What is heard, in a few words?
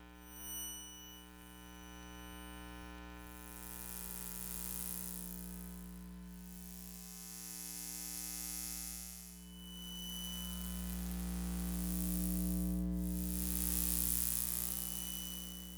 EM Room Noise, electromagnetic sounds; Micro Pack; NazdyNate